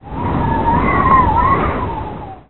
wind blow; Hellboy

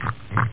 grunt

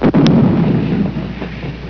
boom

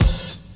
menu popup; PLUS! Themes

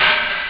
open, drums; OctaMED SoundStudio V1

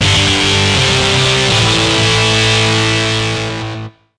guitar; MOBICLIC 14

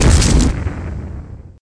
Chain blast; ImpGal2; spacewar

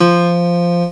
Better piano; Out of this World™ Demo 1.0.1

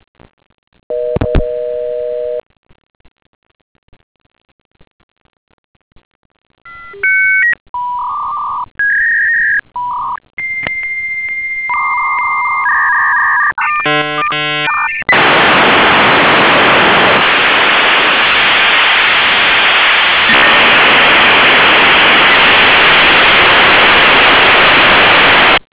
modem

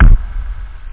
BASS DRUM

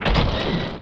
GUN CHNG

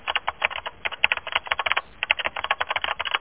TYPING; DUKE3D

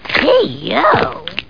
peoo

